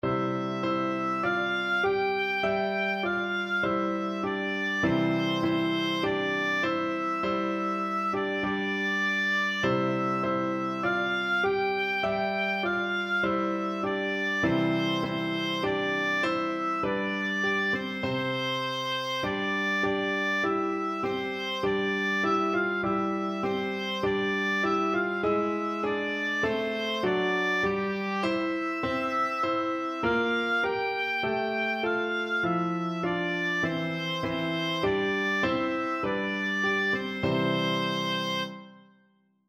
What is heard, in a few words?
beethoven ode to joy, oboe